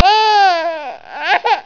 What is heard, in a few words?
Baby Cries